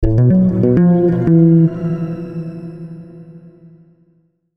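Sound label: Musical Element, Drum Snare March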